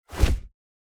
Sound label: crusader strike whoosh